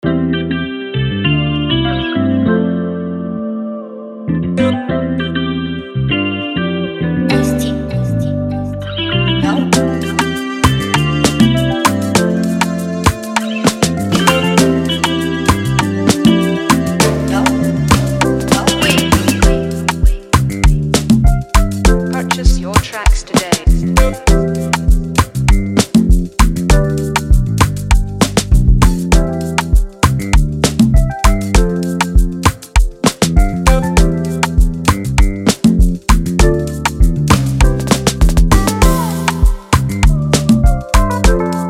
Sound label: FREEBEAT